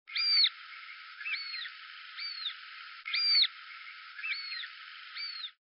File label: Harrier Northern